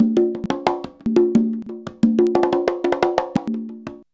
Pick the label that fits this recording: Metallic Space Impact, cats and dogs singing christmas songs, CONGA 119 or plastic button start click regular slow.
CONGA 119